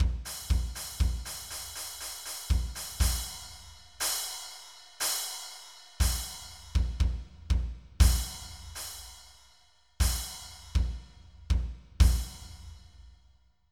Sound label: Pitch it up! Kit3; Onj's Soundfont